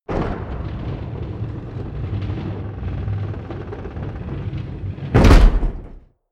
gate wood medium close

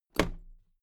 toolbox close drop lid regular

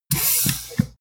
pneumatic open

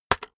keyboard external enter hit fast

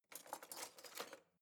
knife wood drawer grab soft

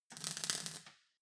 weld sizzle short

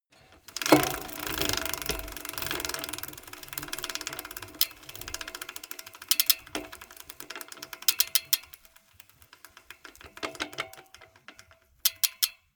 bmx bike freewheel reverse click

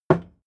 hammer wood

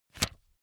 magazine flip back fast

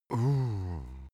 cartoon male pity

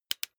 bicycle street gear control down

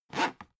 dvd case pick up

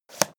leather latch open

